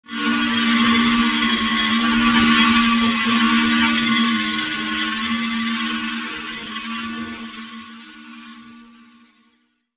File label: pitched event